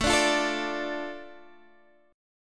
A Suspended 4th Sharp 5th down Guitar Standard; Asus4#5; strummed; JGuitar

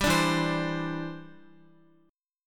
E Minor 7th Sharp 5th, Guitar; Em7#5; strummed; x,x,5,7,4,7

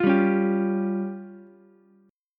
F Suspended 2nd Sharp 5th, F, Ukulele; Fsus2#5 strummed; 10,7,9,10

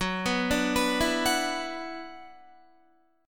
Gb 7th Suspended 4th Sharp 5th Gb Guitar; arpeggiated; JGuitar

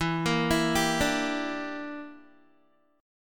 E Minor 7th Flat 5th E, Guitar; Em7b5; arpeggiated

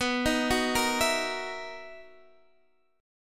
B Major 11th B, guitar

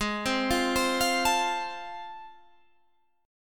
A Minor Major 7th Sharp 5th, Ab

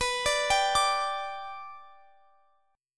G 5th B, chord

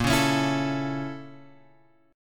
A sharp Minor Major 7th Flat 5th A sharp; A#mM7b5 Chord {6 4 X 6 5 5} strummed

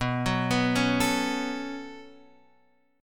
B 7th Suspended 2nd, Guitar, Half Step Down; B7sus2

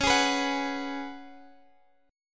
Gb Suspended 2nd Suspended 4th

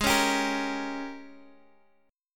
Ab Major 7th Suspended 2nd Suspended 4th Ab; AbM7sus2sus4; x,x,6,6,8,6; strummed; chordshape; JGuitar

